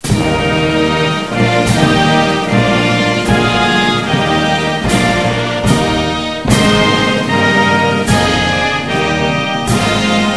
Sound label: anthem german